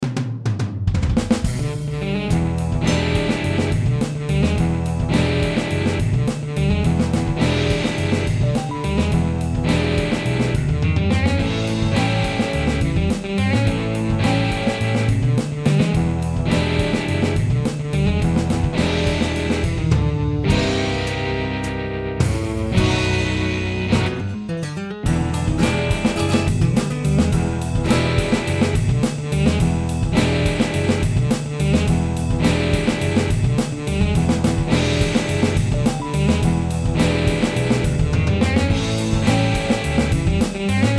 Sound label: blues